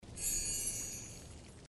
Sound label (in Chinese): 装修完崭新